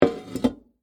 Teapot Set Down, Household, Teapot, Set, Down, On, Wood, Full, Metal, Antique; Kitchen Sound Effects; BlastWave